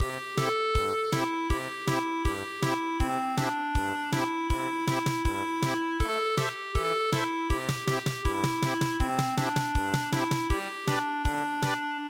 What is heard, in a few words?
German Polka, midi traditional